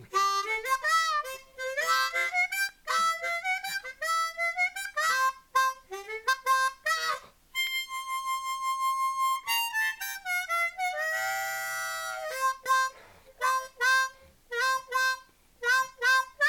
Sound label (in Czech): foukaci harmonika